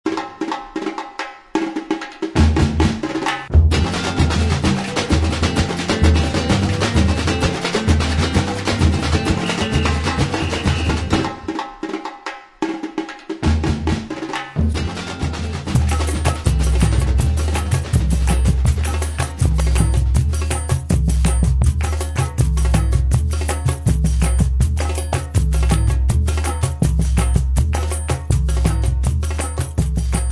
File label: Samba